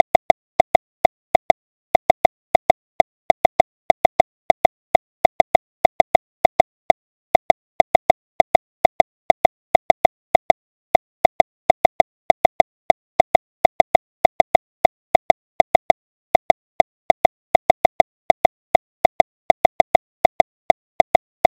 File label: beat